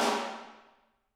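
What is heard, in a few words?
snare drum, Drums; Bob Clearmountain Drums I; SD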